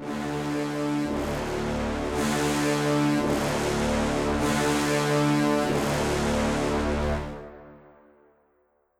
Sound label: Loop End; Legend of Keepers